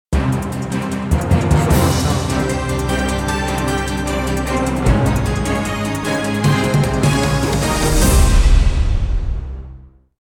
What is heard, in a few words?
Thrilling Action Stinger